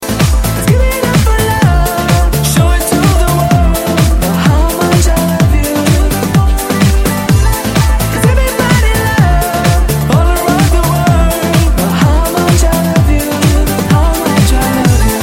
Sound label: nokia morning light music